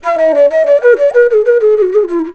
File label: FLUTE D, Indian Traditions